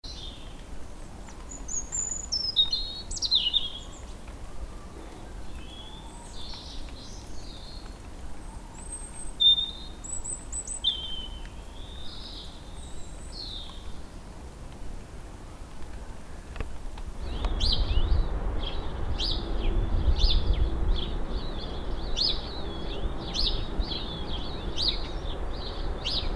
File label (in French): Oiseaux; rando brive sud